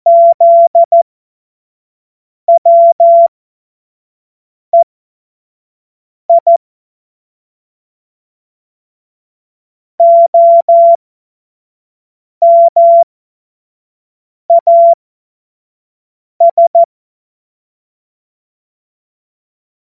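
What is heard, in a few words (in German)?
Witz, cw morsen; Morsekurs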